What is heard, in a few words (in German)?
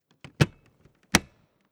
Heizlüfter Dyson Hot AM04 Filterklappe aufstecken